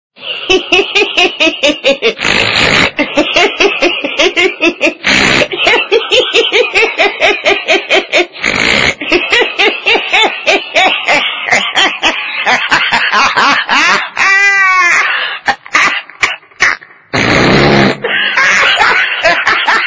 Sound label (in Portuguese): Vinheta Risada do macaco